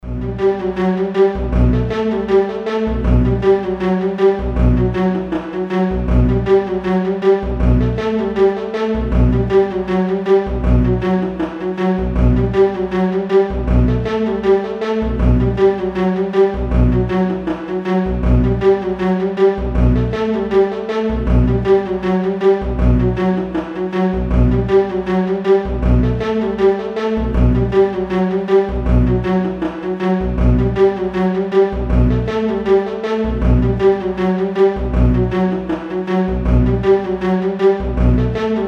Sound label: beats